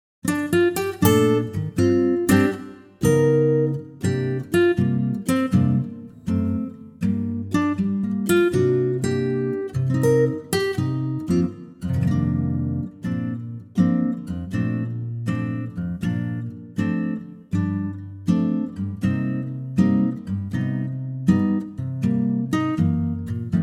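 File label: Bb Acoustic Guitar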